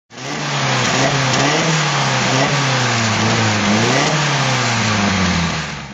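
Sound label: alfa romeo giulia1600 sprint throttle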